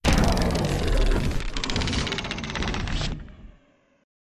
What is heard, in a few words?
slow Reel Spin; finn